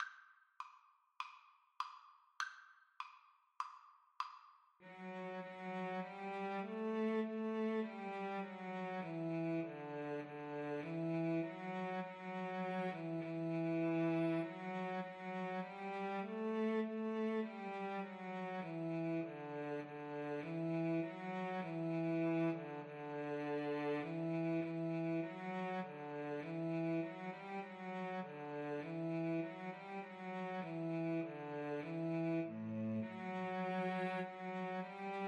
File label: ode to joy, Cello Duet; beethoven